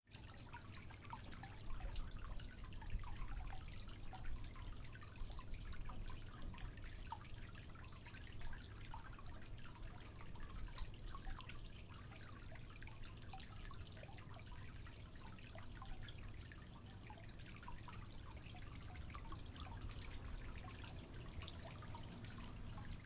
water leak

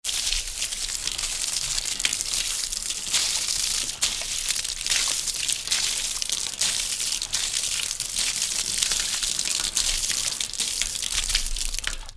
water on metal surface

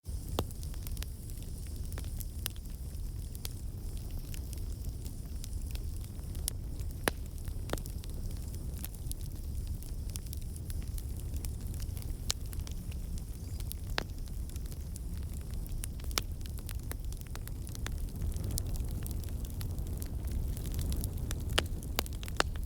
daytime forrest bonfire

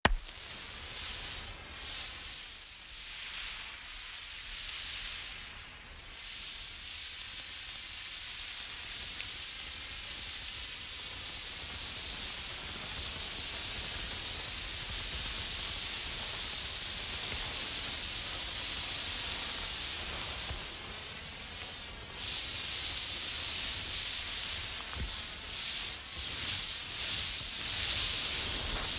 emergency flare movement

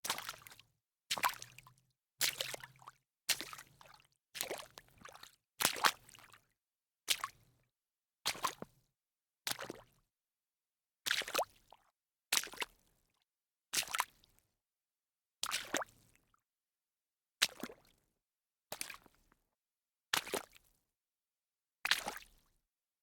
walk in shallow water series, foley

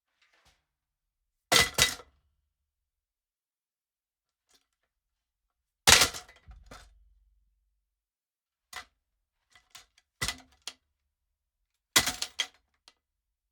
crash layer kick pedal

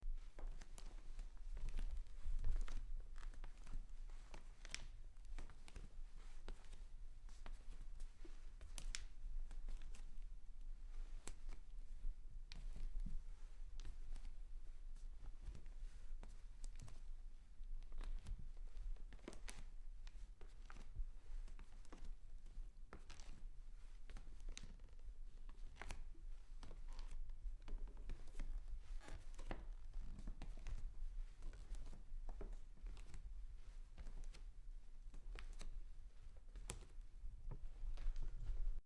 bare feet walk

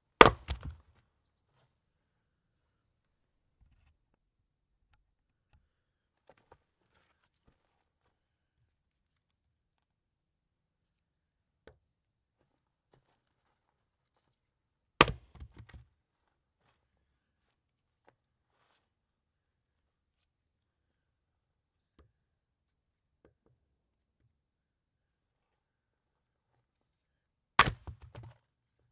splitting fire wood

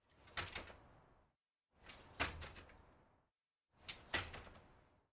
bicycle front wheel land

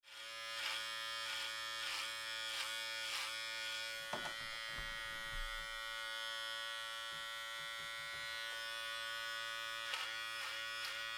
hair clippers cutting

